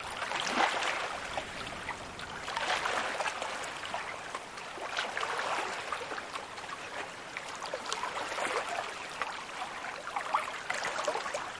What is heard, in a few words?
Bay Water Lapping Aga, Water Lap, Very Light Movement With Heavy